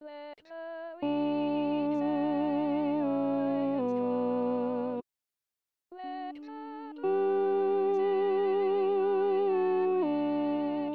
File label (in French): Hosanna Alto, choristes